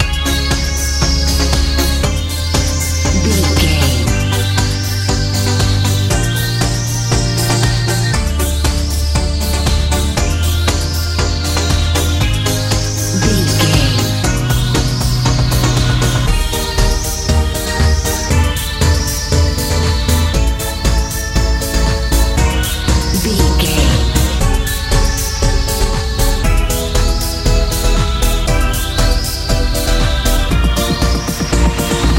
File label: nice colorful house